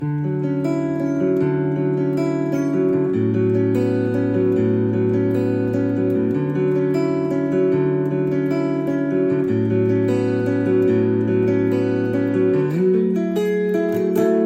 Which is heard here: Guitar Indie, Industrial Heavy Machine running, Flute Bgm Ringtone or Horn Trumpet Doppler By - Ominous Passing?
Guitar Indie